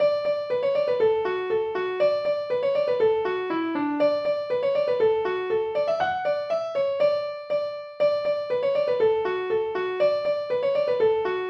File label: polka; john ryans